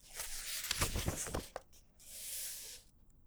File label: Page Turn; Books, Paper, Writing